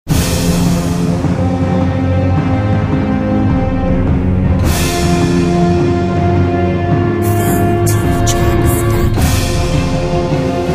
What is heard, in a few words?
Action Game